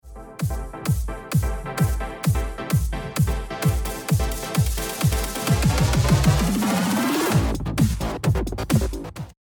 edm song

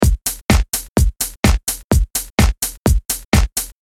drum; studio one

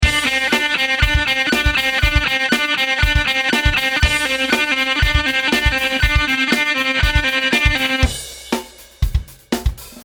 pulling off

